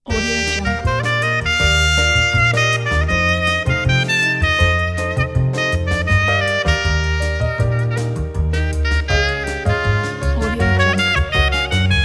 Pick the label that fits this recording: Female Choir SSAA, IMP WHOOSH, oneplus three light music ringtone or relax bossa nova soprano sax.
relax bossa nova soprano sax